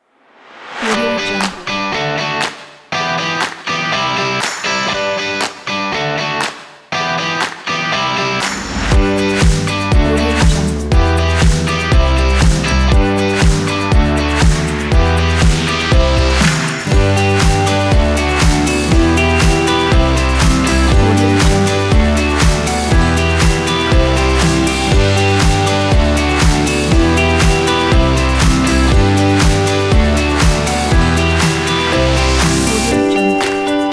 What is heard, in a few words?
energetic indie pop kit